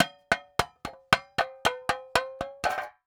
Industry Paint Can Lid Hammered Back On With Rubber Hammer